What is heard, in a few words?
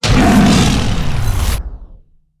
big win start; netent